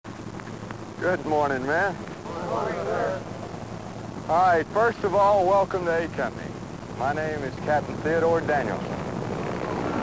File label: ambien